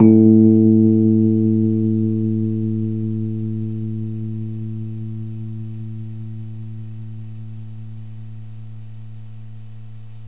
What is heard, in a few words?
RHODES SLO SO, 3D Keys; 3D Sound; 3D Keys VOL-1